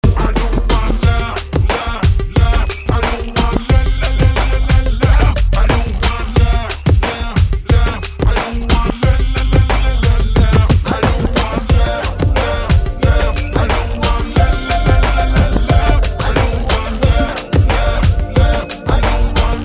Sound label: Ding Dang